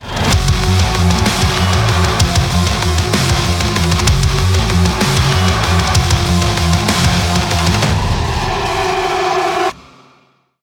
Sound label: heavy; Doom